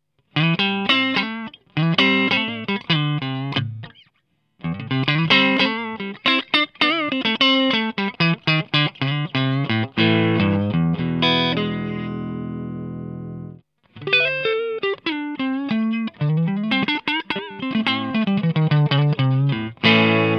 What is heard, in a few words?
20w Clean